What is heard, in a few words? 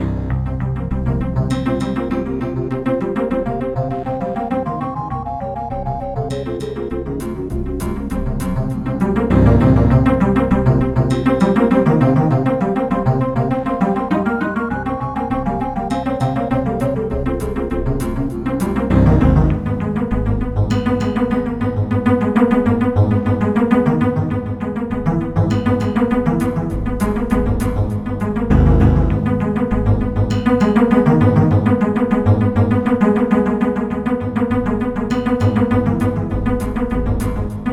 alien theme